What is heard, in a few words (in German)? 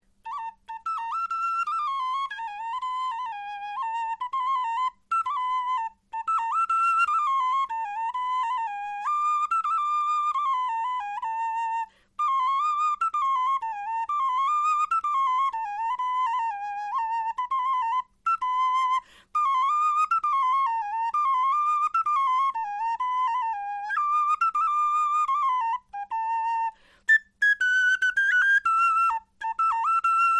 melodie